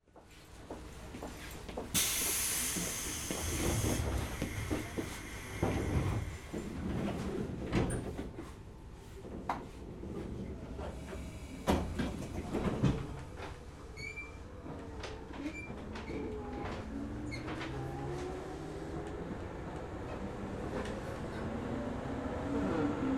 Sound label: Seibu 101N; 西武鉄道101N系外扇モーター車 新宿線 快速急行川越号; 録音車両：モハ３１１; 西武新宿→高田馬場; 高田馬場→田無; 田無→東村山; 東村山→所沢; 所沢→新所沢; 新所沢→狭山市; 狭山市→本川越